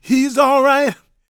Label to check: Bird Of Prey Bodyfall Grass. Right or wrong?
Wrong